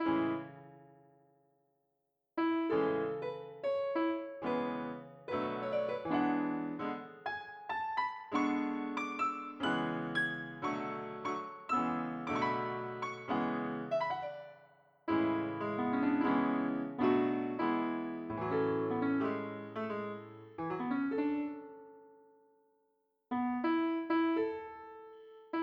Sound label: piano